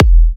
Kicks E; markus hakala

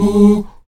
VOX AHOO, Afro Chants, Stacc Ah Oos

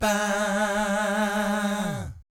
VIB FX, Jazz FX

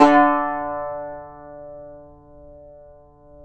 yayli tambur

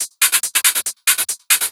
Loops 140 BPM Hihat Percussion Loops

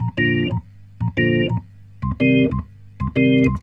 Roots Reggae, B-3; Sounds Good

